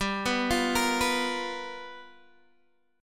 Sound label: G-7th Sharp 9th-G-x,12,9,10,11,9 down Guitar Open G